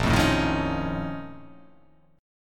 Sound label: A sharp Minor Major 11th